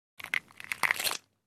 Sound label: Egg shell crack break kitchen